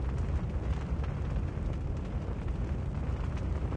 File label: Ambient - Oven; 3 Days Zoo Mystery